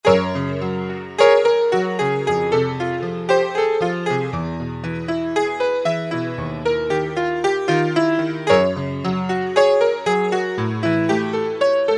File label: Double A.P, Roland U-110; Factory Patch